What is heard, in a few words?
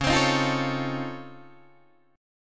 C sharp Augmented Major 9th, C sharp, down, Guitar, Open D